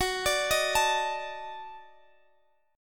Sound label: Eb Minor Major 7th Flat 5th Gb